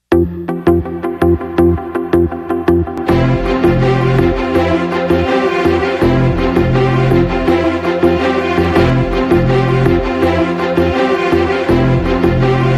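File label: movie background music song ringtone; nithiin; keerthy suresh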